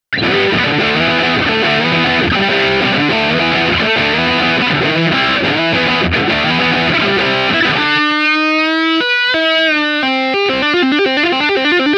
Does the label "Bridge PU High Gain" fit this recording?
Yes